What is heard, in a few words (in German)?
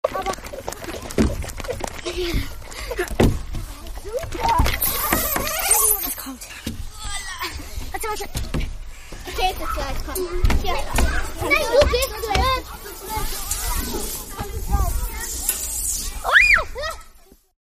spielplatz; herterichstrasse